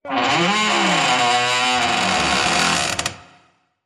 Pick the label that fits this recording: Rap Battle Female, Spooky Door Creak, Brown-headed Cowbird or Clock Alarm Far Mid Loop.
Spooky Door Creak